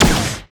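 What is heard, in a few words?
FG SHOTS, future gun; Total Control - Action Cutz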